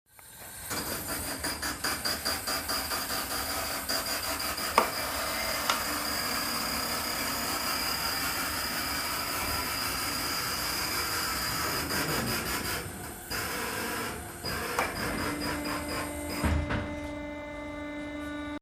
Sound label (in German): Hydraulik